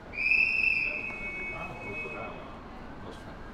Train Crew Whistle Departure

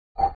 Blow

